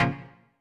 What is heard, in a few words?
Stab Db; 137bpm; Big Fish Audio; Old Skool House; OSH Construction Kits; OSH Belfast